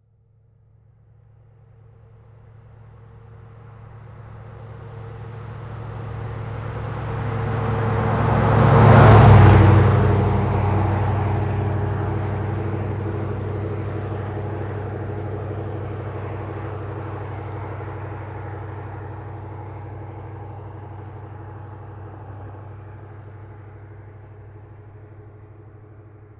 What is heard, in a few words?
Policecar